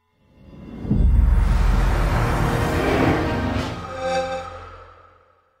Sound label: Xbox 360 Sonic Branding Sound Logo; Audiobrain